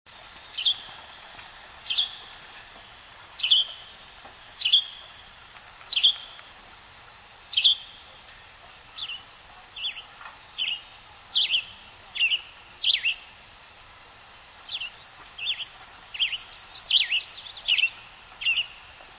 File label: tanager western song and perdeeks; song and "per-deek" calls, Glacier Point, Yosemite National Park; 5:00 p.m. in pines; birdsongs